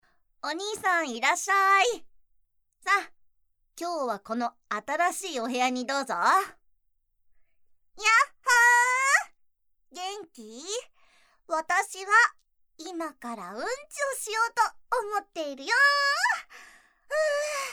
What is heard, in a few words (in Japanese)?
オホ声ゲップ脱糞